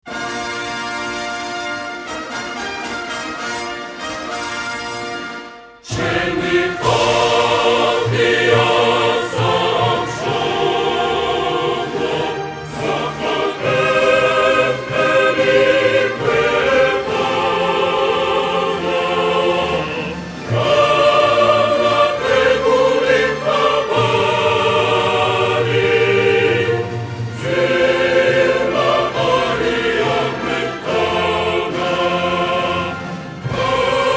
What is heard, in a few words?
Georgia Vocal